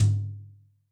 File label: Brsh Rk Lo, Tom, Yamaha DTXpress-II